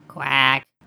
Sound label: quack